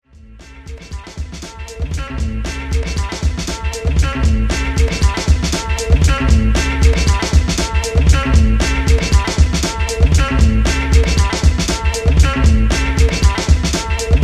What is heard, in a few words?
Slum Drums